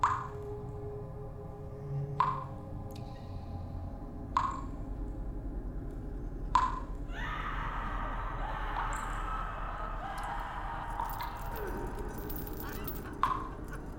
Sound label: oubliette, ambience; pf2e; hellbreakers